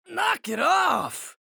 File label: pain ability damage; anarki; low health